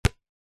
soccer ball slightly deflated single bounce slightly wet asphalt concrete; sport